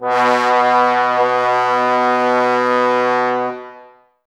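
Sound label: Brass trombones B; Miroslav Vitous - Woodwind Brass Ensemble; Tbs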